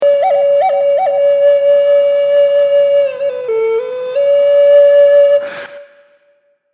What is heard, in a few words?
flute